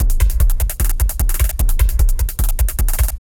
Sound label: LOOP, 150; Masterbits - Tekkno Trax; D LOOPS